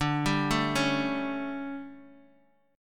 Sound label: D Major 7th Suspended 4th, Guitar, DADGAD